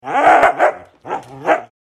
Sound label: Playful dog barking barks